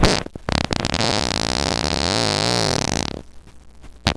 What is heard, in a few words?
fart